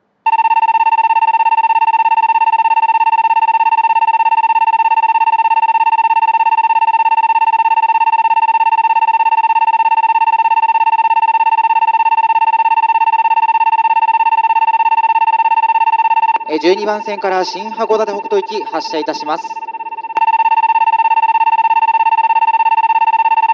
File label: kikonai 12 bannsenn